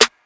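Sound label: verby steel snare drum a key, tuned to the A note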